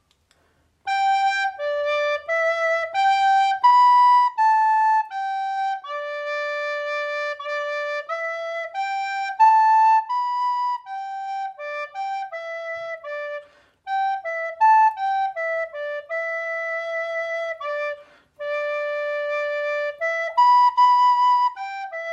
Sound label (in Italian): PENTATON MELODI seconda voce